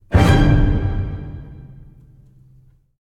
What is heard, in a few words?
Stingers Stabs; gamerip; Hades